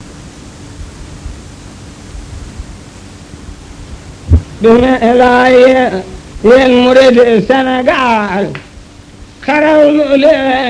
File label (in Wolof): wolofal, wolof